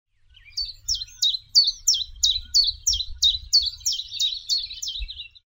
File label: chiffchaff lee valley